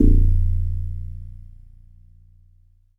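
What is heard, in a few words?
Jazz Harp Kawaii A#4; keys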